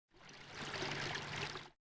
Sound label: Water Move; Civ4; Resouces and Improvements